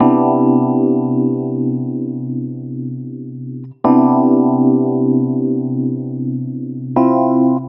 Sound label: Jazzy EP; Disco House